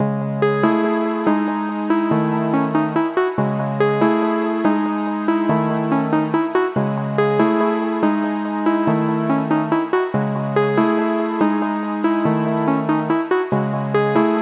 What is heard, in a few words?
142 BPM C# Min Pluck, Loop Stems; Cymatics; 2022 Melody USB Expansion; Tonka